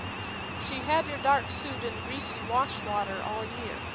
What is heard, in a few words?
noisy buccaneer1 SNR5